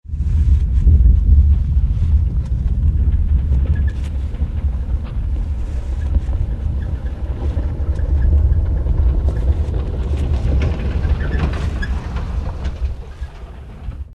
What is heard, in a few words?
quake; super bit adventure; android game